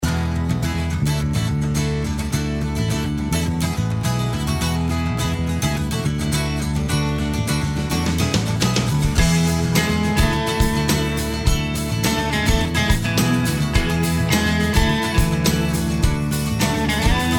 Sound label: guitar